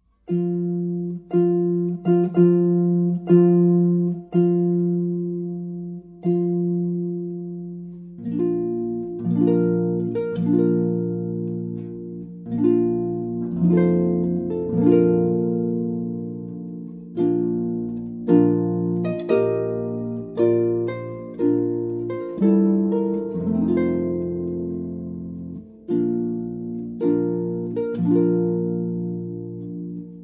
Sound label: Bridal Chorus; Richard Wagner